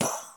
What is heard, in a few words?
boom